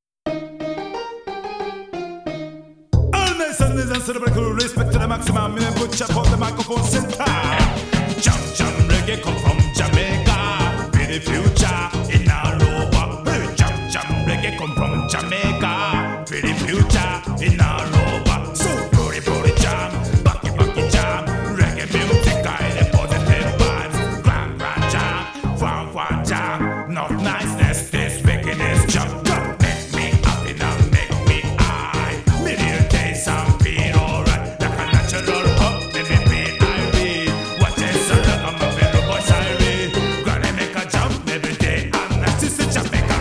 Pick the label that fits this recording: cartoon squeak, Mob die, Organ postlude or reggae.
reggae